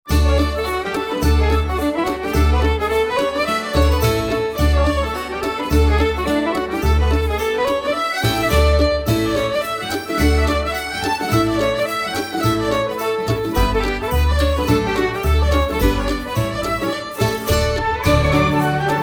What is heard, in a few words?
A Parrots Life